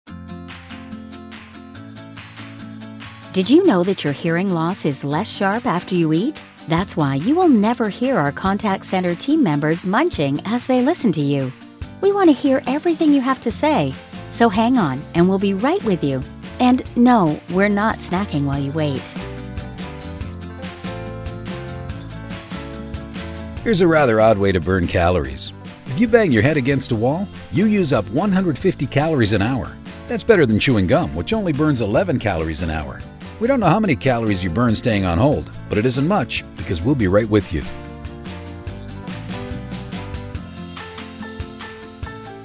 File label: phone on hold; MOH